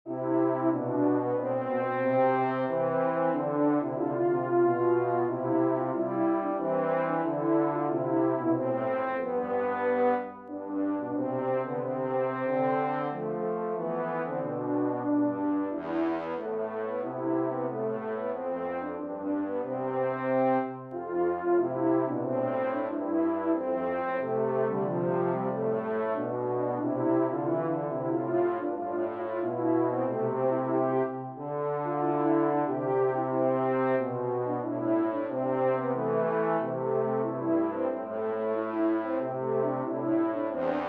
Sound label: Brass for 2 3